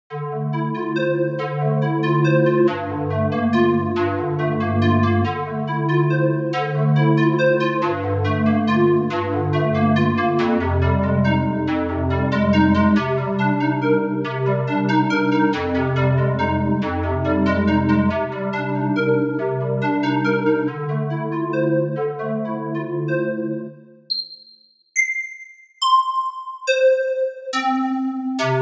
Noise Square, struck instrument simulator

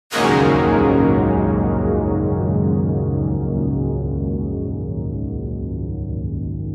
Noise Saw, struck instrument simulator

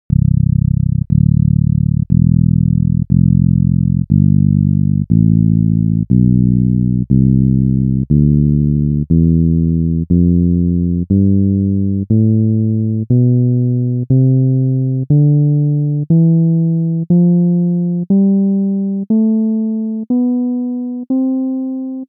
bass

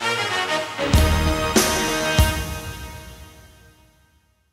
music

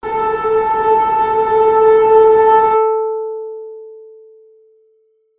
string model

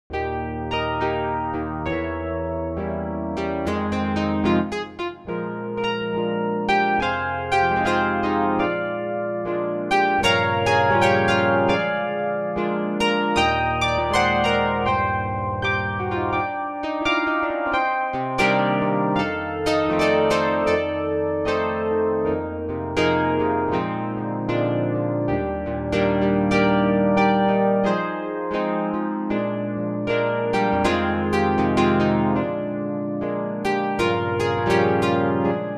karplus, sampler

